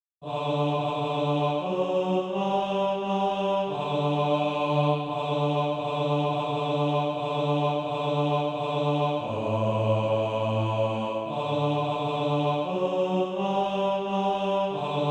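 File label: bass, Sheet music (SATB) including Practise Music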